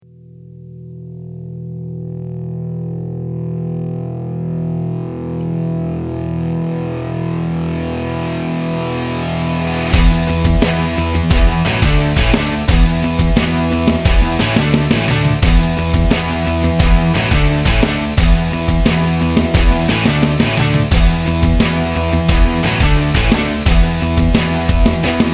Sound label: headbanging